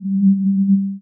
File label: Science Fiction Sci-Fi Electronic Cloaking Device